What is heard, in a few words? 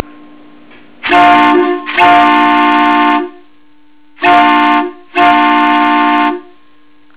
Daylight Whistle